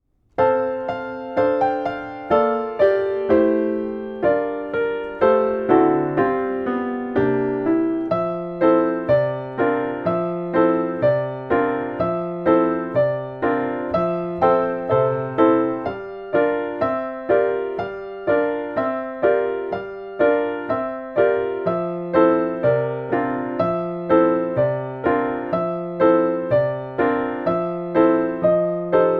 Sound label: piano